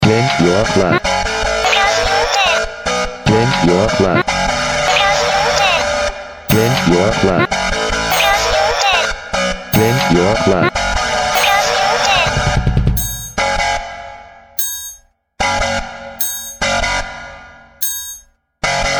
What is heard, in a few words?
instrumental